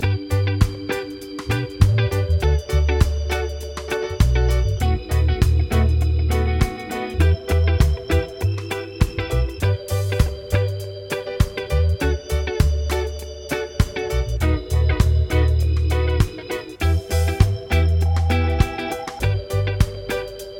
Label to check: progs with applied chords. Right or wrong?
Right